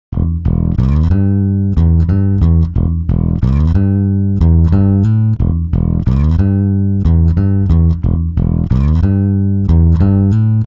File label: bass 110; timestretch; prosoniq time factory dirac